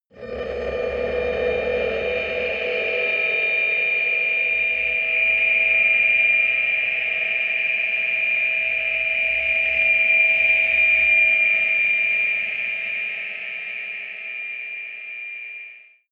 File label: air feedback hard